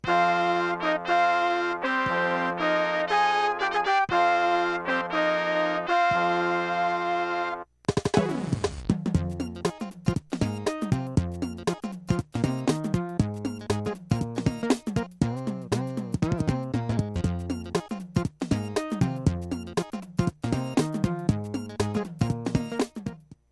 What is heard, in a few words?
intro, nvidia nv1 gm; Soundcard test page; Lost Vikings